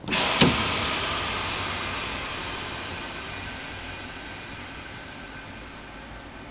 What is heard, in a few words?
Air, Train; OpenBVE